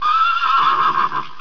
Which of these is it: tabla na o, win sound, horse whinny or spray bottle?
horse whinny